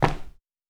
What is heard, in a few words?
Light Sneaker